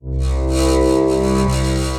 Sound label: Buzz String; Strings; Ableton; Factory Packs; Granulator III